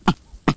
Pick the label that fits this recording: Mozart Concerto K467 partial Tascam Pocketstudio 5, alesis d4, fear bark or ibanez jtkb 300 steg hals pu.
fear bark